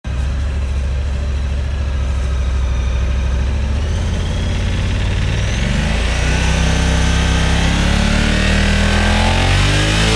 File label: Honda Talon Stock Exhaust